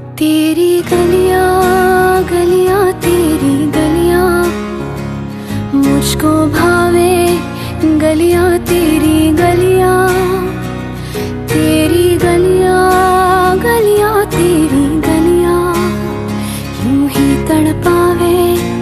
female version

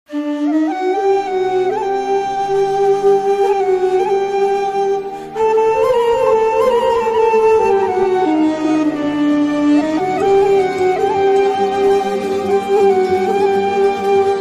Flute Bgm

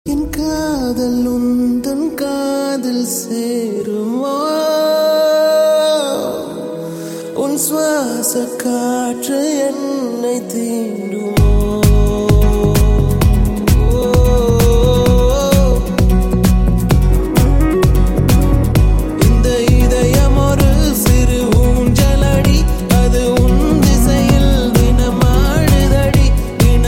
Ringtone

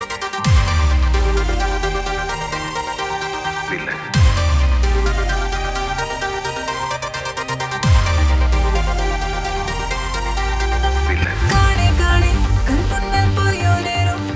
Villain Theme Starting Tone

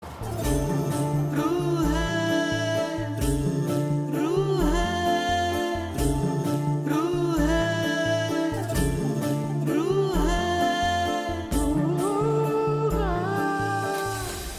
Trailer BGM; Sufiyum Sujatayum

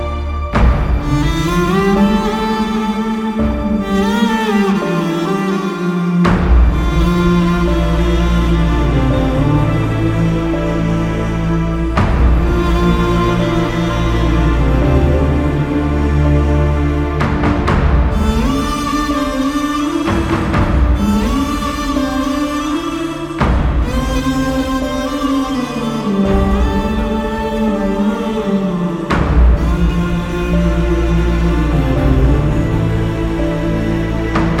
Sad Flute Bgm

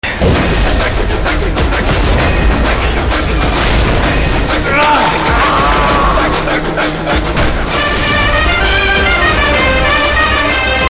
Trailer BG Tone

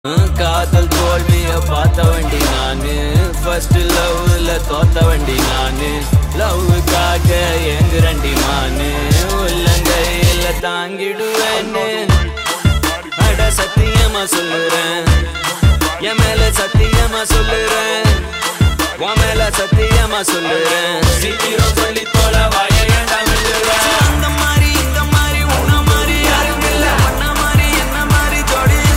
Love Ringtone

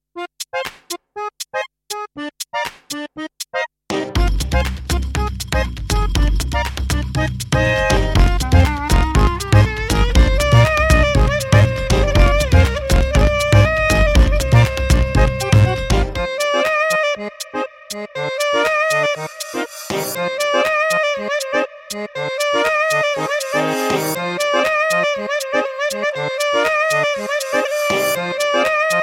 Beach BGM Ringtone

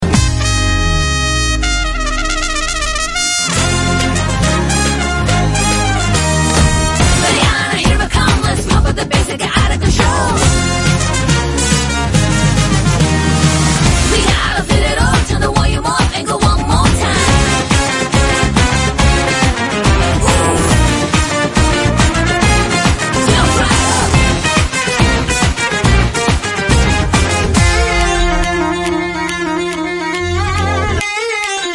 Instrumental Ringtone

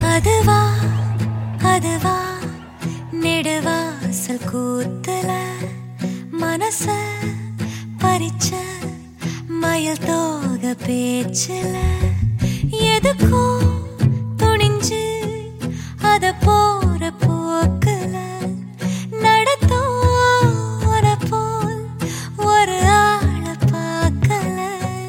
Rain Version Ringtone

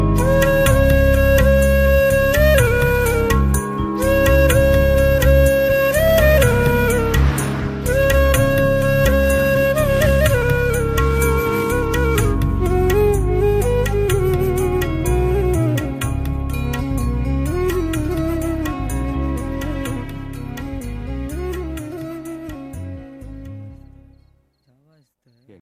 Flute Bgm

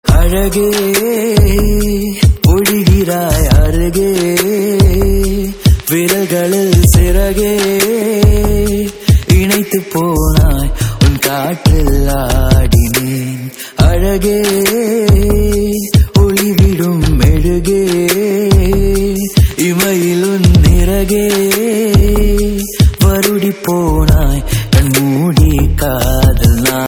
Male Ringtone

